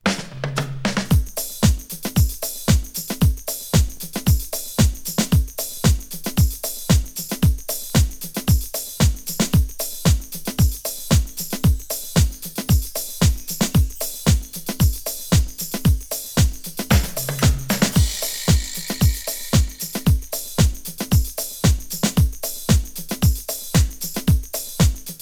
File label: House